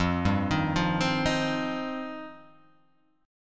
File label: F 7th Suspended 2nd F down